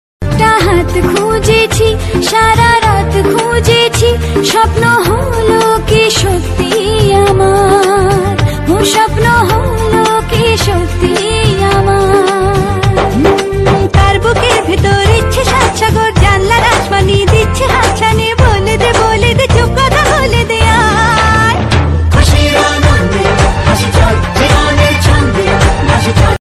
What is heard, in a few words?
bengali ringtone